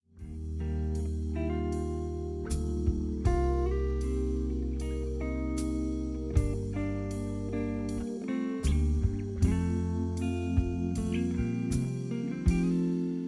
Lern-Sopran und Solo

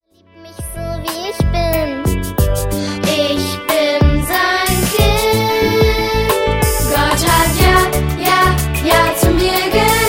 Chor

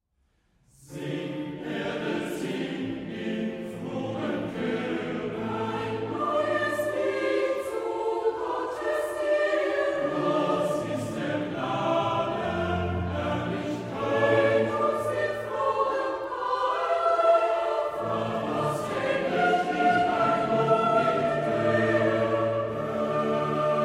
Chor; Psalm 96

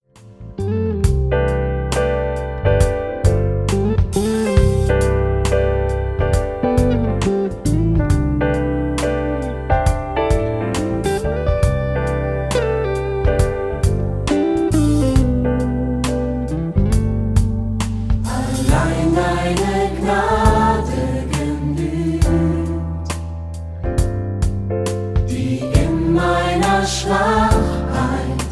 StudioChor

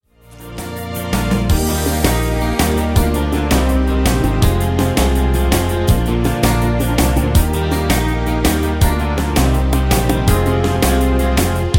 SMS ohne Backings

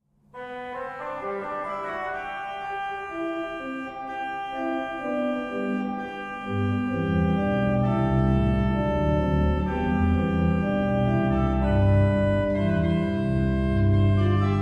Orgel; Buxtehude

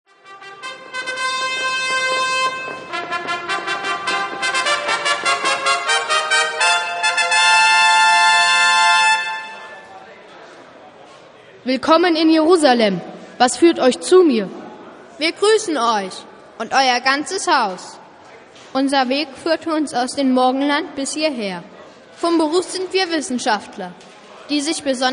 Koenigsfanfare

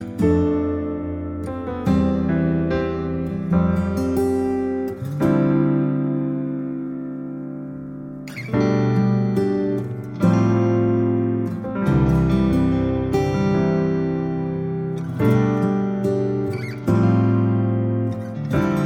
Playback ohne Backings

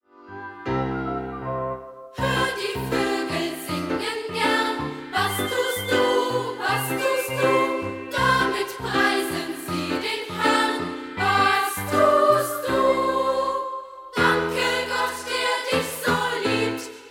Kinderchor

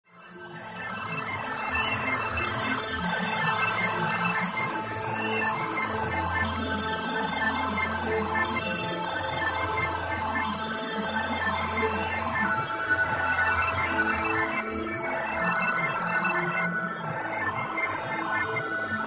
Hintergrundmusik zur Begruessung-Playback

